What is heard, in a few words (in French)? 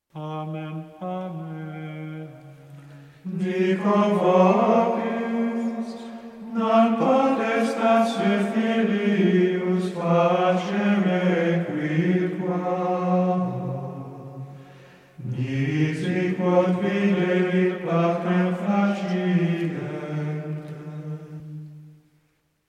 Antienne Amen Amen, canti